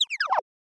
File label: cute sad